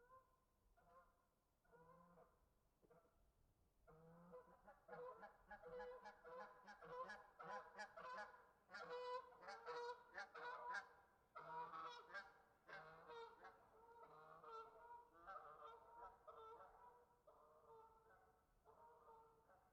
geese outside

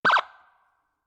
emergency siren short burst

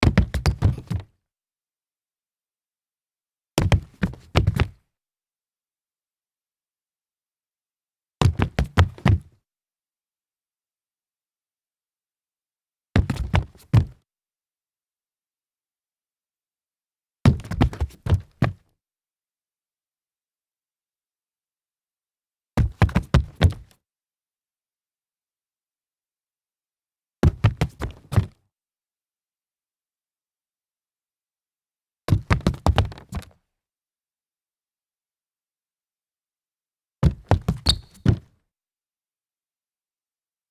sneaker mount stumble hollow wood, foley

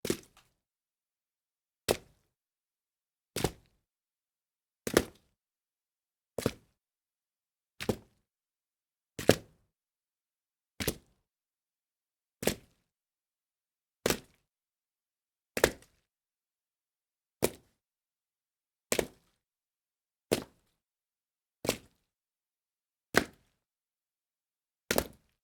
water splashes series

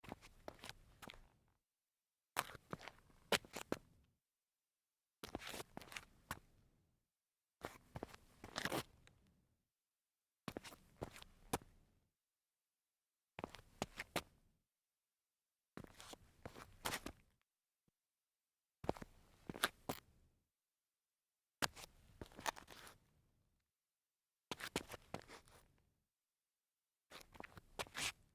sneaker turnaround concrete

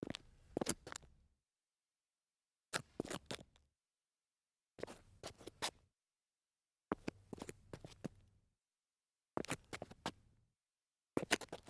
dress shoe turn concrete

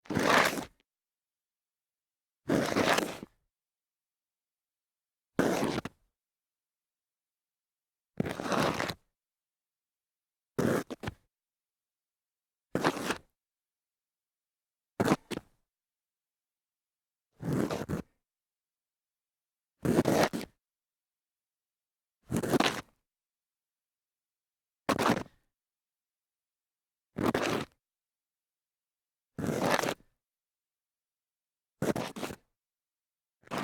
slide on concrete series

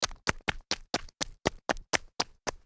run on gravel

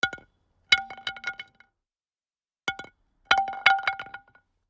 wooden bat dropped on asphalt

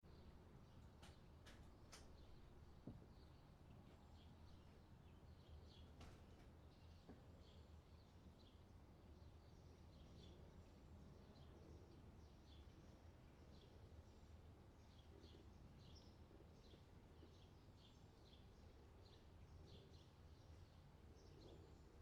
outdoor summer ambience

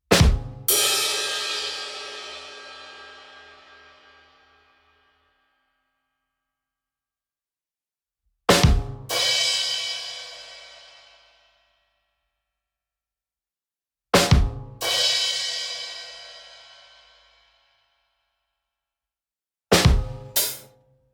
punchline drum

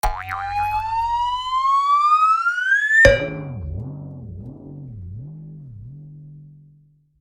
cartoony bounce to ceiling

